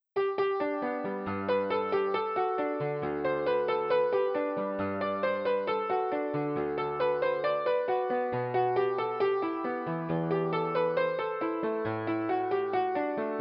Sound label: Reverb Chorus Delay; P8-Schantz; EQ; FX